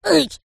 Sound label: cartoon voice high pitched pain grunt